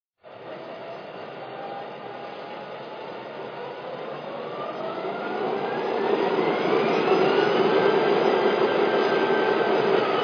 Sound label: gp30, electric trains